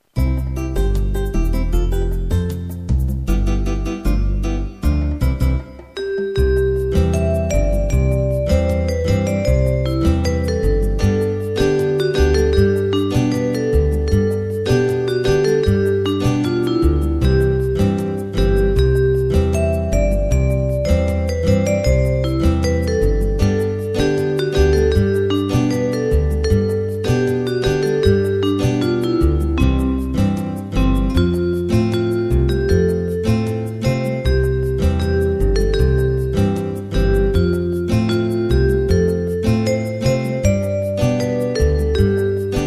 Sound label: South American Tune